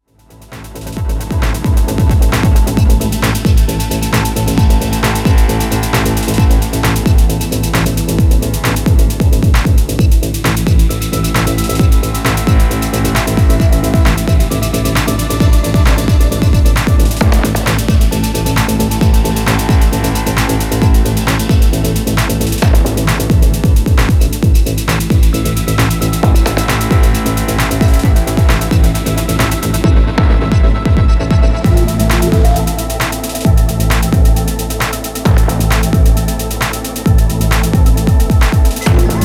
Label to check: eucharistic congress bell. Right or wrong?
Wrong